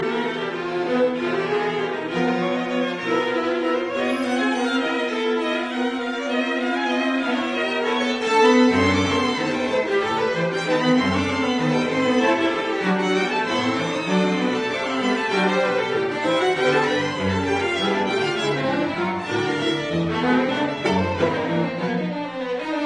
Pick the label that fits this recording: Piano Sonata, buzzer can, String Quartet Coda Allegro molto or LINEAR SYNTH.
String Quartet Coda Allegro molto